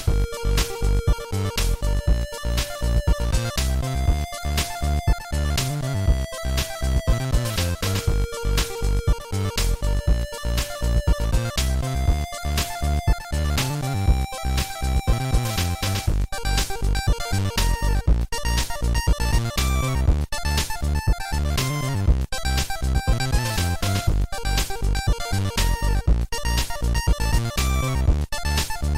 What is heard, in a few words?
8 bit